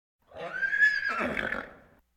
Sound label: Animal effects snorts grunts farm ambience; Valentino Production Sound Effects Library CD50